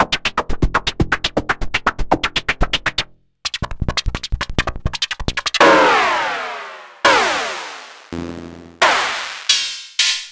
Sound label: Hat c